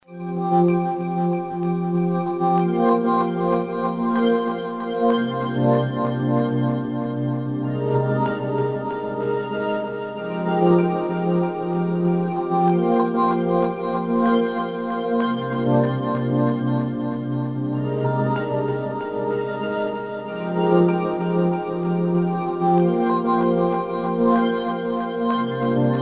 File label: Just Melody; Chuck's Zombie Petting Zoo; Marr0w Productions